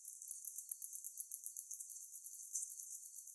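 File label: Rousettus aegyptiacus ultrasound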